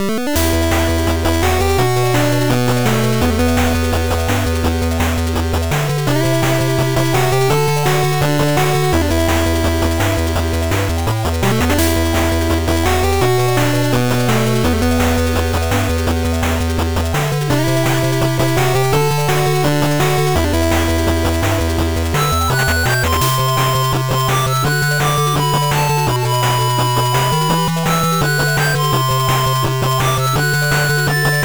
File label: 8bit